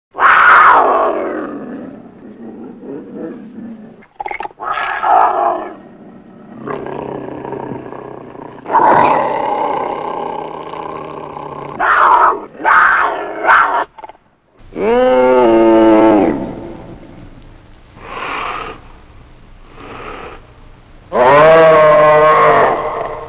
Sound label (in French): puma